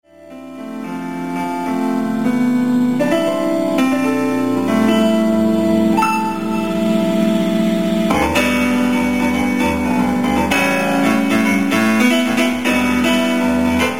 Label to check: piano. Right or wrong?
Right